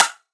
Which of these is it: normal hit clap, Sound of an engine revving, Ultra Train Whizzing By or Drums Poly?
normal hit clap